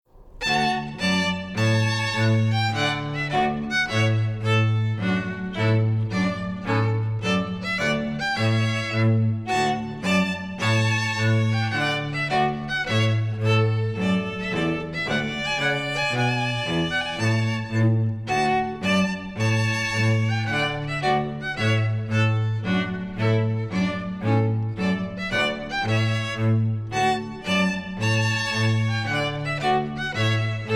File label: trio trumpet tune